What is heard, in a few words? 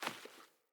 Sandals Walk Vegetation, footsteps; Player